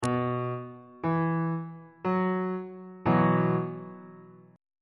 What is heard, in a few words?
e suspended 2nd 2nd inversion on bass clef